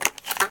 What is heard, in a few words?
shotgun reload; weapons; hl2